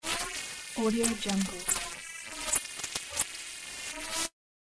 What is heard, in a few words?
broken signal